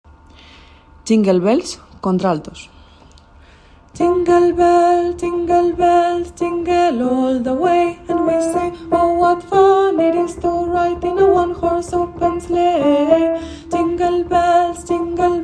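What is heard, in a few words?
alto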